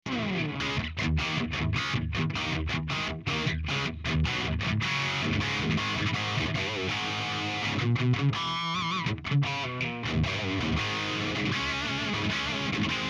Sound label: RIFFS